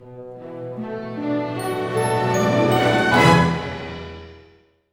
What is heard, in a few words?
FLY; East West; Scoring Tools; ORC; Flying Star